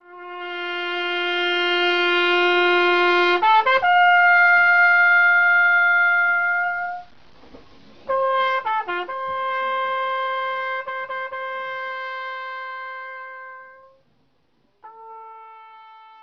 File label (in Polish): Hejnal mariacki; Hejnal mariacki fragment